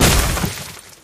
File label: broken glass fall; Golden Crayon Sounds